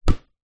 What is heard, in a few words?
sport beach soccer ball bounce